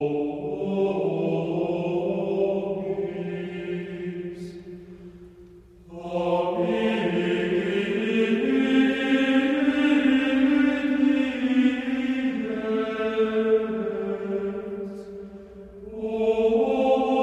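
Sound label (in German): Mönchsgesang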